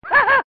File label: ha ha, voice; ooh ahh